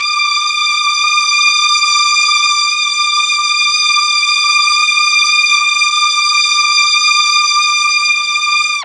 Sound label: D4 16 violin, mellotron; TRON 16VLNS